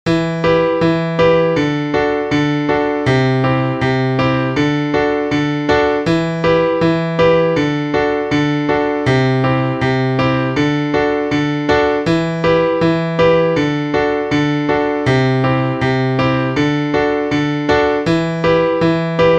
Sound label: 80 bpm piano